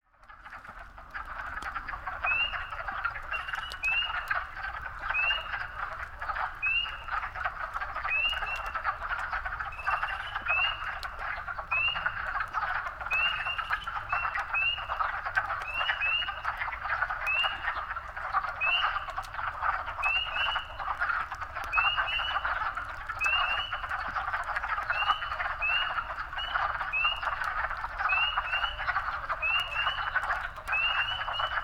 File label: wood frog group